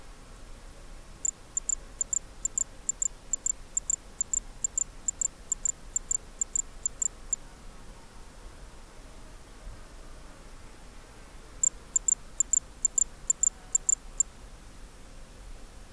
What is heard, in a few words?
RINKY DINK CRICKET